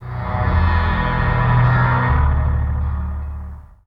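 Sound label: Big Rock Med, Elektrik Drone, One-Shot Beds; Sonic Foundry (Sony Creative Software) - Friendly Fire; DroneLoop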